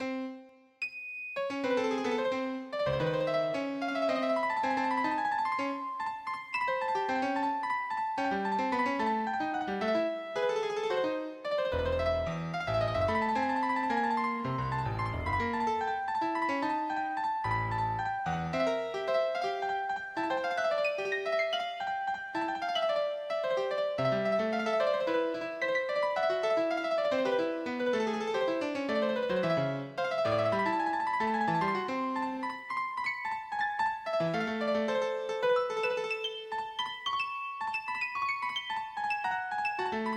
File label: piano; Rondo Alla Turca